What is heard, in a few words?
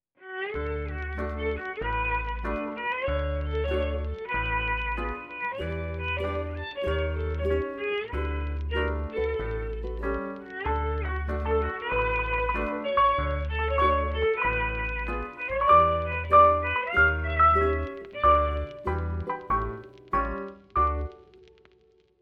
Jazz Violin sketch